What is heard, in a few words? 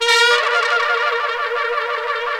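BRS TPTS, brass trumpets FX; Roland L-CDX-03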